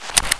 m3 deploy, weapons

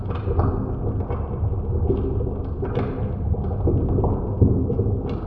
amb hydroponics; cstrike

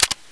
mp5 clip in

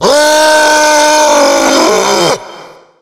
hulk yell; zombie plague

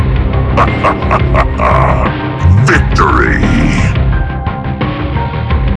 zm win

